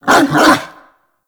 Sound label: Kubrow Tame Loot Success A; Warframe